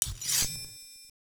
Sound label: Sword